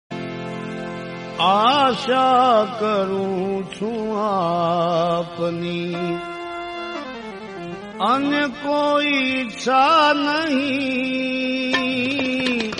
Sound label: bhajan